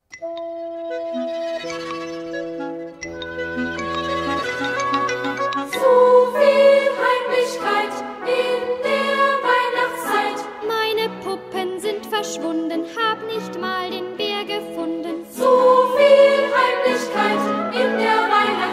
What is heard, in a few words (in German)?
Kinderchor